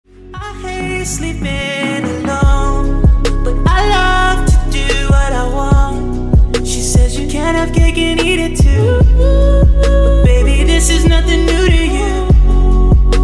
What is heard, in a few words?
Is Calling Inspirational